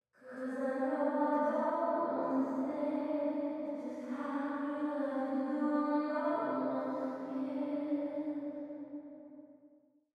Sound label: Wet But Upfront, Unnatural Verb Duller, Solo, lead vocals